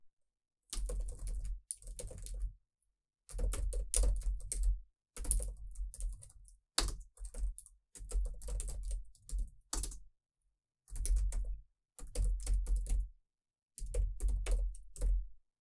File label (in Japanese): タイピング遊び 右